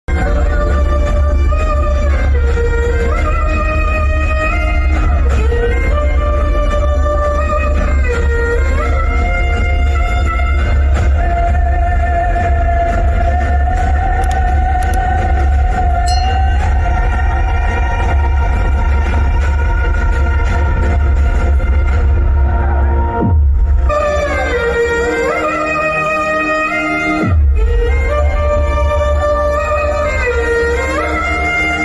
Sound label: interval bgm, sad bgm; mem famous movie